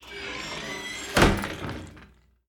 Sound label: Shades Close